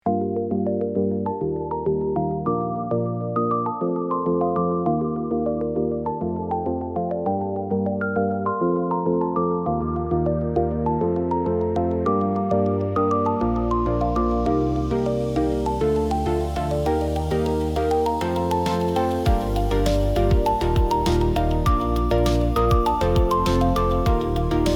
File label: Corporate motivational